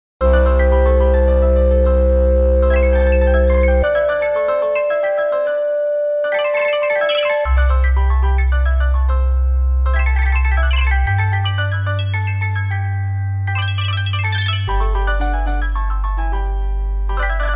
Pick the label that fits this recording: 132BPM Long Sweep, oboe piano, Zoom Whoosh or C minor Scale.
oboe piano